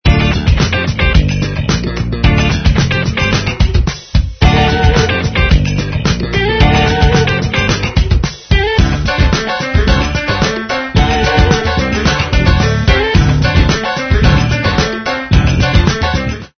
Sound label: funk rock